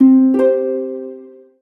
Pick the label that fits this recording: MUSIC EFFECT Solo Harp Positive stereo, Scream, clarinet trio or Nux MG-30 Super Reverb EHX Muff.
MUSIC EFFECT Solo Harp Positive stereo